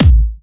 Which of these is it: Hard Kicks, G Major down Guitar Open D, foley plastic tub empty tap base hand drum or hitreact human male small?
Hard Kicks